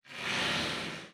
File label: crit spatter; weapons; dota; phantom assassin